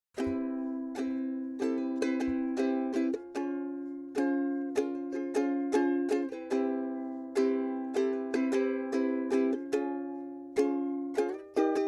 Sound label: Ukulele Foo